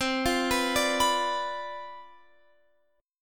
C Major 7th Suspended 2nd Suspended 4th C down Guitar Open F, chord; CM7sus2sus4 arpeggiated